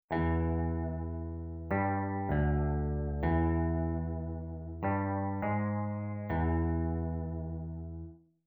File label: Twang Hang